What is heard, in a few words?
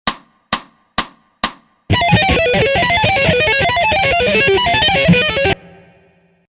Whole Tone Taps fast